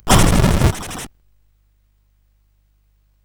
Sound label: Fire Breath, CSG8580R5